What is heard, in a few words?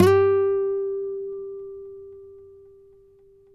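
nylon, FLAMENCO, Guitars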